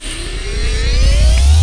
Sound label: Power Up